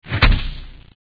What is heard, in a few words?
sword into body; Palm OS; EDGE